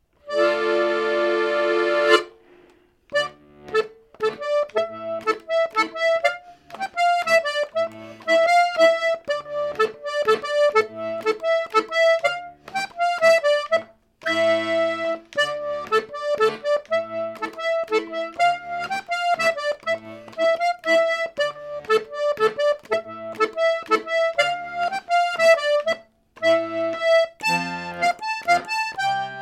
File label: Menuet; Menuet 3; Rasmus Storm